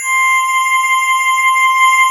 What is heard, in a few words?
C5, SYNTH; BELDANDY; Q Up Arts; Dream Experience